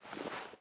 weapon movement; weapons

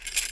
spas sling; weapons

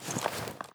weapon movement walk; weapons

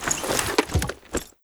rpg deploy; weapons

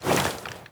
weapon movement sprint; weapons

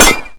hit, crossbow; weapons

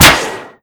rpg shot down; weapons

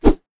crowbar swing; weapons

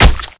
crossbow hit bod; weapons

